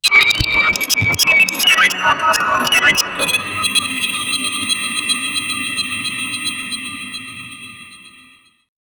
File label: sci-fi transformation